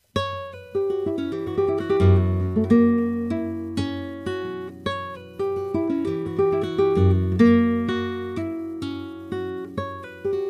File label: Solea, Flamenca guitars